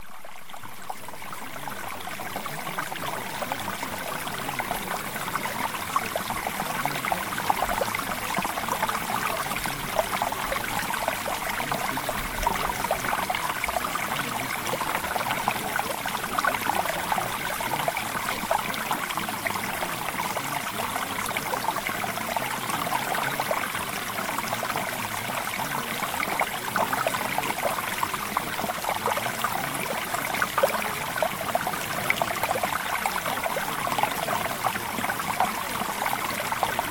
Mountain Stream; Reality Shifting

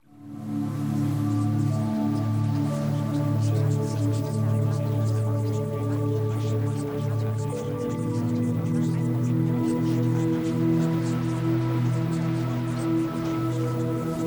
Music; Travel Adventure

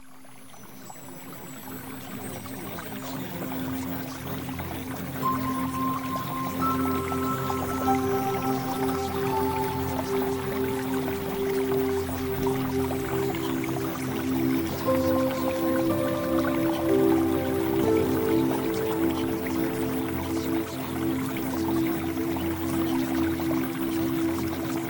Music

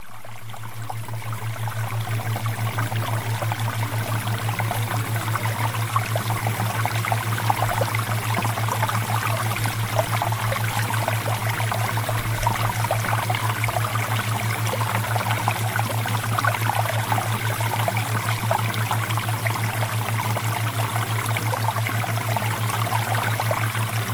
Water Theta Waves; Premium Subliminals; Success With Men

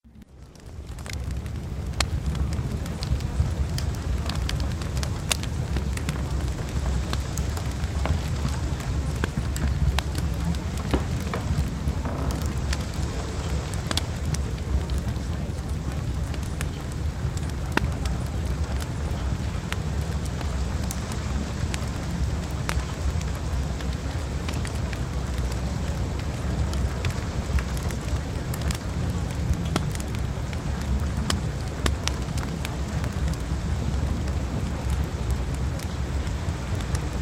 Fireplace; Premium Subliminals

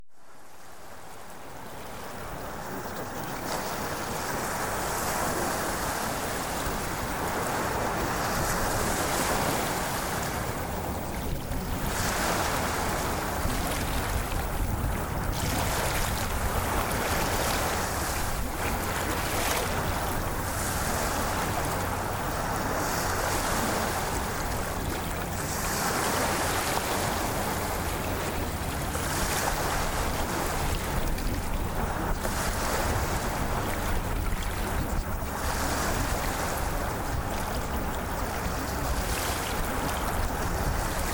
Ocean Waves Sample; Spiritual

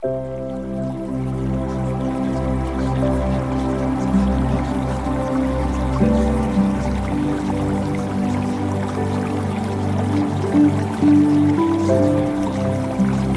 Music; Premium Subliminals

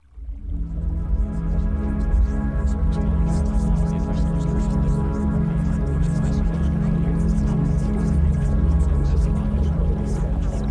Music; Premium Subliminals

